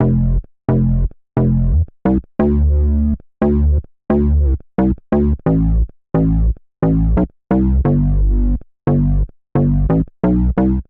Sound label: Bass C Major 88 bpm, Bassline; MIDI Clips; Tonal; Orion; Ableton; Factory Packs; Chop and Swing